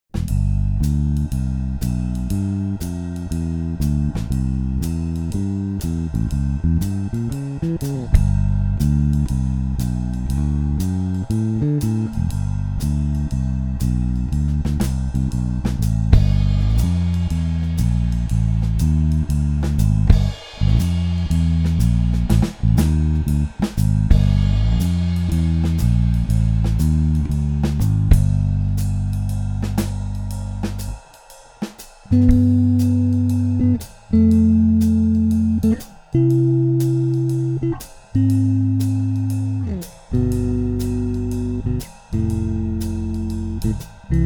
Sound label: Jazz Mix, basses